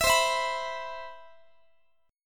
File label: C Major 7th, Guitar; CM7; strummed; x,x,19,22,20,22; 4A,4E,5A,5D,5G#,6C#